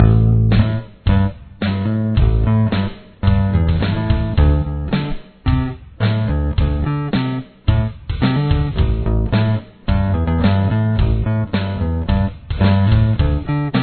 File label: bass